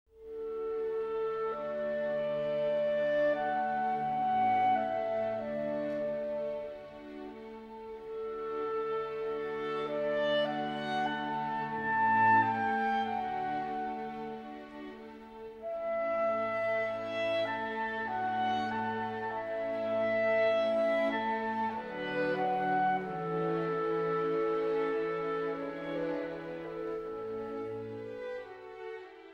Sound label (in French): clarinette